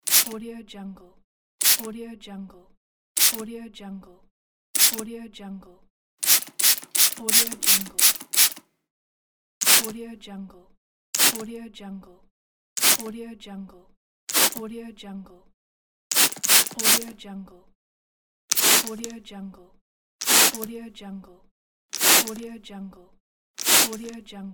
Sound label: water spray bottle; gamechestaudio